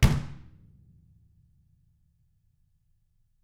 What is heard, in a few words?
DW HARD, KICK